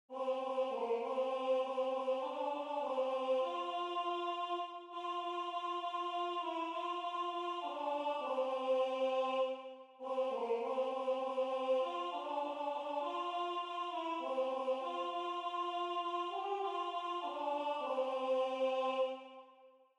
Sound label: Alto